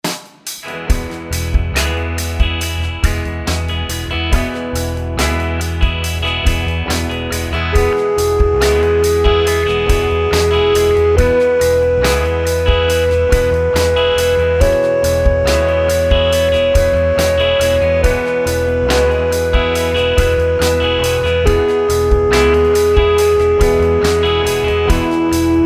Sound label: scale degree